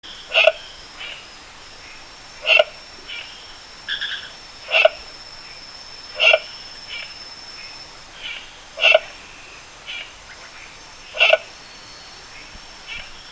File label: Sylvirana nigrovittata